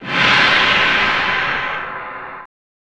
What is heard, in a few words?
Gong; chinhai; 1995 snes